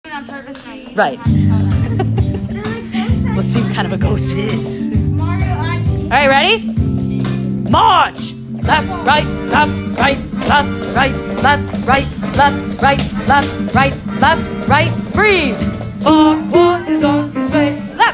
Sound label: Regiment vocal practice